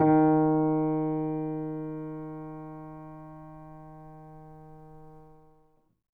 MONO, Acoustic Piano; InVision Interactive; Miroslav Vitous

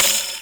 Pandeiro Jingle Roll; Multisamples; Digital Sound Factory Planet Earth

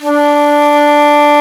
Shakuhachi D3; Multisamples; Digital Sound Factory Planet Earth

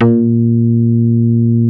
B3, digital bass; GENESIS; Music Store - Claudius Diemeter Schwerlos

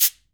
CABASA; Sonic Reality; Interactive Drum Kits

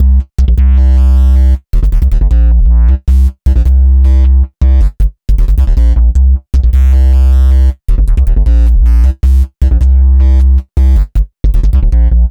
Synth Bass

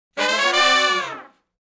Fast Climb Up (D) har, Horncraft for R&B; Crimson Blue and Fabulous Horncraft 4 RnB; Sections

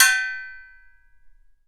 Satellite Drum; PX7; Digital Sound Factory Protean Drums

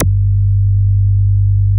MOOG G1, ANALOG BASS; Music Store - Claudius Diemeter Schwerlos

